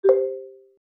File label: error